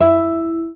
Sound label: Classic Guitar